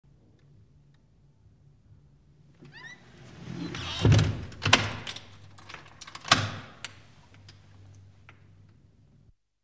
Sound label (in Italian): Chiusura a chiave